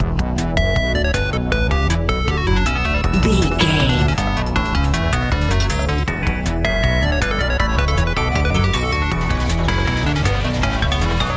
epic video game adventure

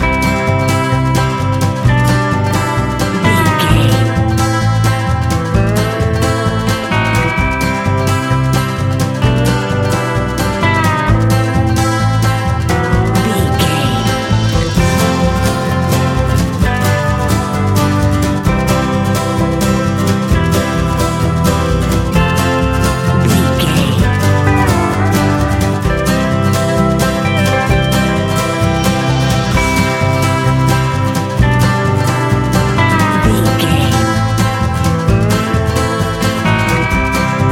traveling hillbilly loop

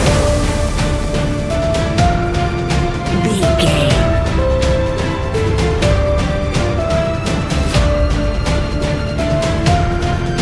final fight epic action loop

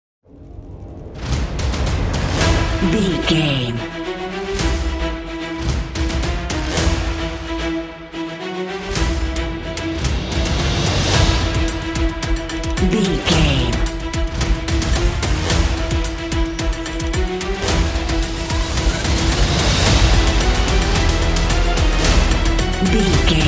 intense villains action

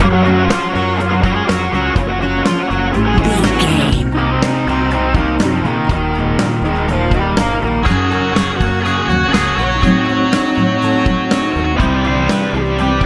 strike big warm hopeful life easy rock

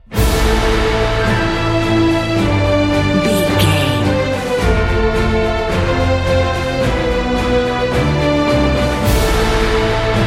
epic cinematic

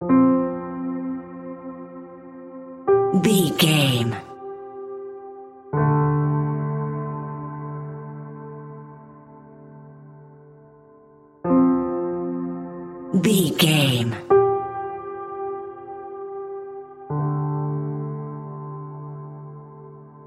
wandering darkness piano loop

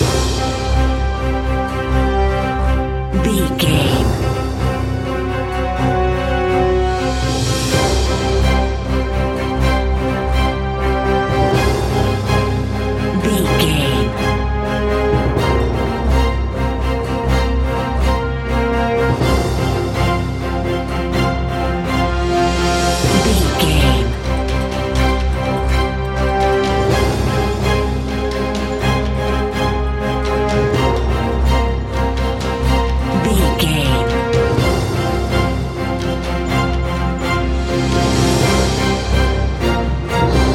let battle commence standard

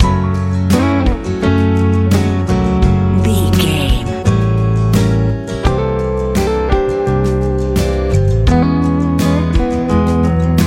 nice powerful drive easy coyntry pop

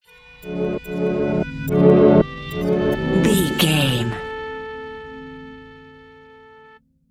reed blow

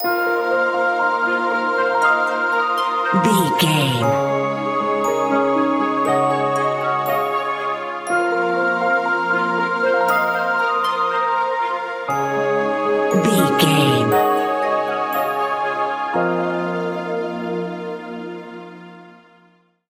enchanted mystical forest bumper

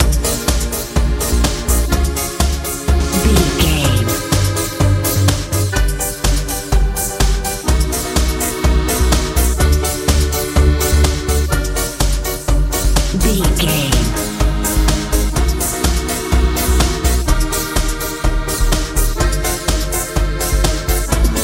sunny nice modern dance

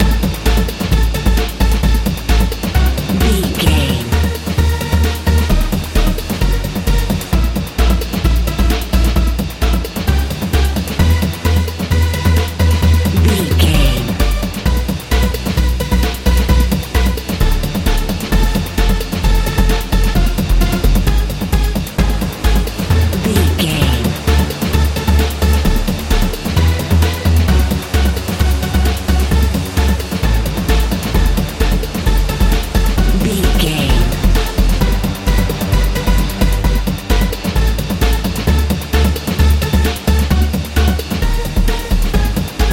sunny warm big wind sprint life techno

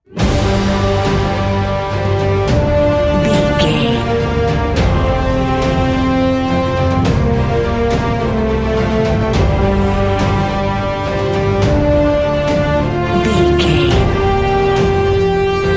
epic cinematic

